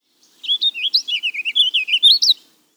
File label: Warbling Vireo Eastern Song